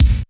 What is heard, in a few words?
BD